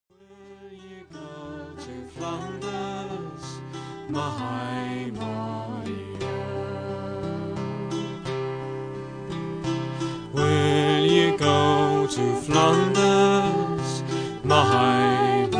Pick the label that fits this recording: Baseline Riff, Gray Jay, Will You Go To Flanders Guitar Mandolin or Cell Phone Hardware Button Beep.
Will You Go To Flanders Guitar Mandolin